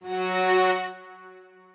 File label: strings